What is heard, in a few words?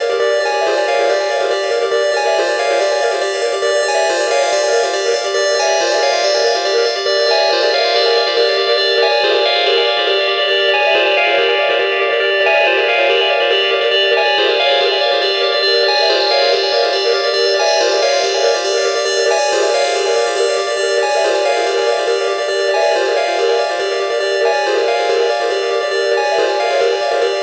NRG Pad